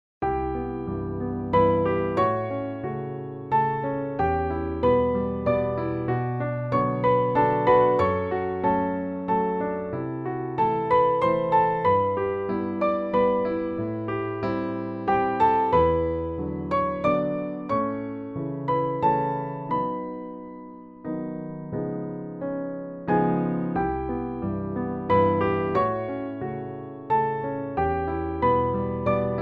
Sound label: waltz; recital pieces